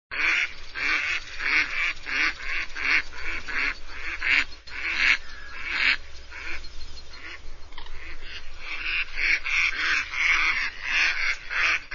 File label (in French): nature troupeau canard